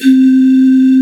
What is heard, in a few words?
BOTTLE BLOW C4; Big Fish Audio; Synth City